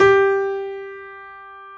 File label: PF G3, G.PIANO; AKAI S-Series CD-ROM Sound Library VOL-7; G.PIANO 1